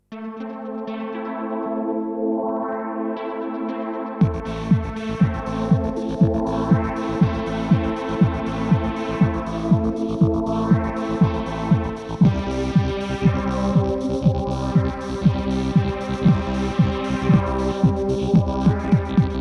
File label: Techno